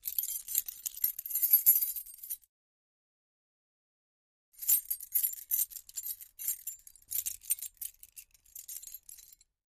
Keys Shake x2

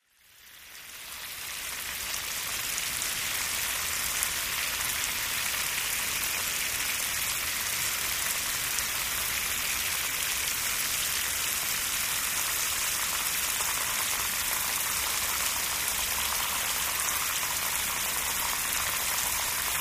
Rain Fall On Concrete, rainfall

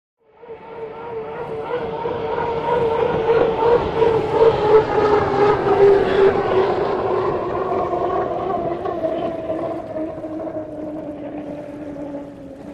Hydroplane By Splas

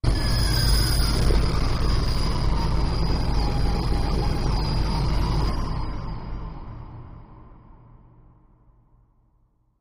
Jettison Machine High, spaceship fx

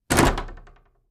Attic Closet Door Clo

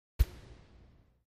Racquetball Swing

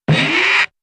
Boing Bounce Impact; impacts

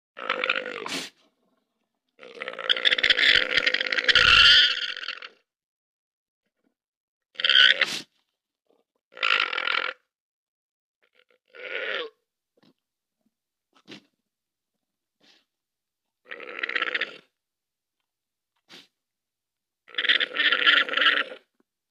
Llama Gag Screech Mul

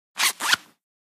Zipper Short Light

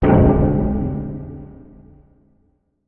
Bass Impact Tonal Be, impacts

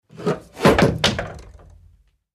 Hanging Kick Awy Chai, crashes & creaks

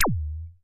laser, lasers

